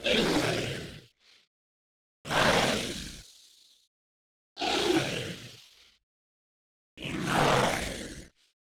Gargoyle Flesh Ripper Hit Reaction

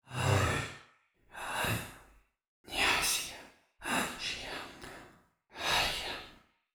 Elves Hit Reaction

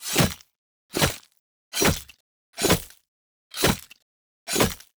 AXE 2H

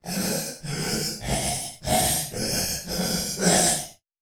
Skeleton Archer Hit Reactions

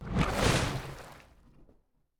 Bolt Impact

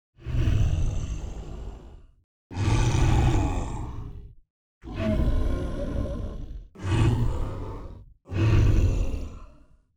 Golem Slaver Hit Reaction

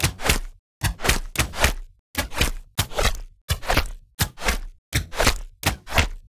Bow and Arrows

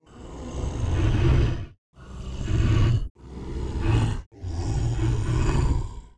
Golem Slaver Idle

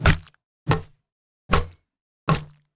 Staff 2H

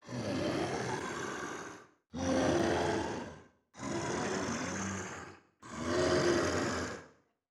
Treeman Sapling Idle

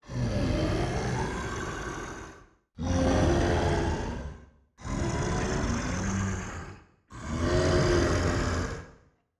Treeman Large Idle

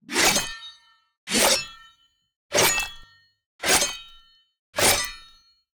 Sword 2H

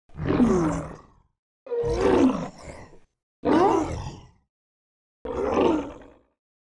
Hit Reaction

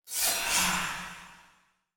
Improved Backstab Sound

